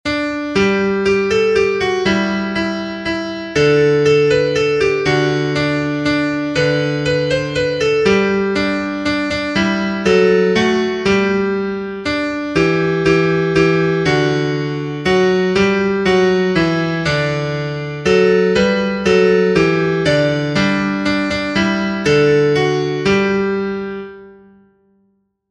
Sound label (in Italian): Natale inglese, pianoforte